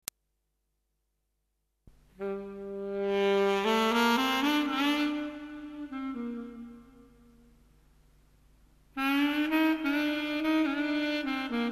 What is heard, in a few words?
Saxophone